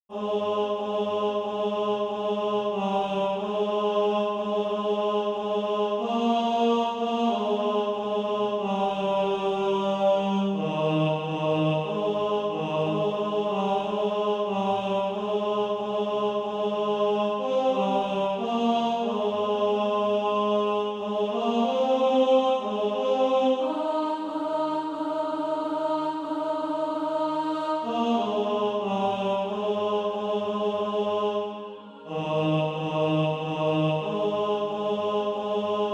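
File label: tenor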